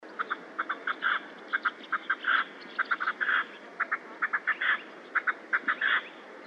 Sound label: Red-legged Partridge